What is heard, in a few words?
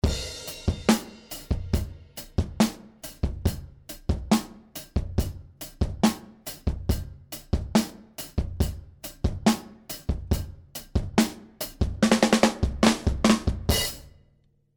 Drums More Drive; Elysia Karacter